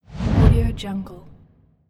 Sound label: fast whoosh